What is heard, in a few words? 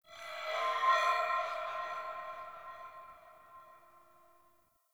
Texture Interior Medium; Sentient Lair